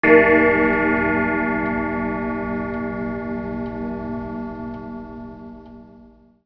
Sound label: clock chime; orologi